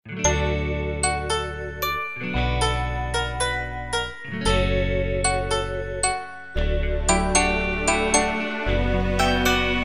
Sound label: iphone unplugged ringtone